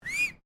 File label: FEMALE WHISTLE SHORT; Female vocal sounds; Voice